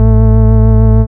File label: BASS; E-Lab; X Static Goldmine 1